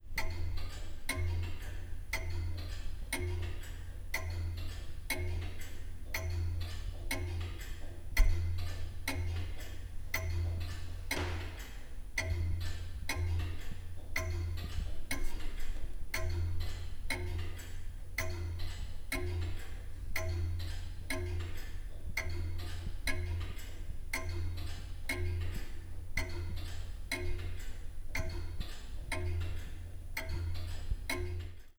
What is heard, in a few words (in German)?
Bodenstanduhr; Henry Bateson 1700